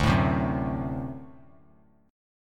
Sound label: D 7th, down; D7 strummed; JGuitar